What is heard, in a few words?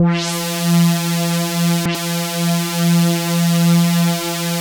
Atmo Pads; Best Service; XX Large - Pads